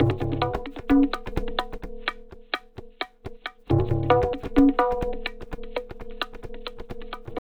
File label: Tabla, 130 BPM; Zero-G; Pure Tabla